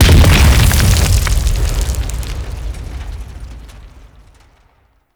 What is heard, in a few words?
Generic Environment Explosion; Gameplay; Mission Four; Warframe; The New War